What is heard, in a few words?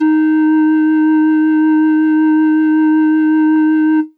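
Pan Flute DX100 D#3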